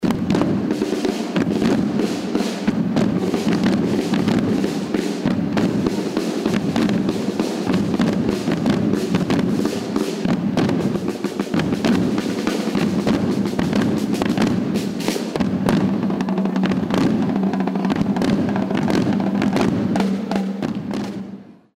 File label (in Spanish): toque tambor